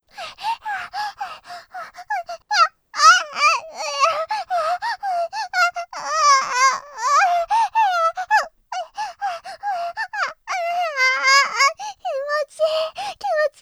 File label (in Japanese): 喘ぎスパイス！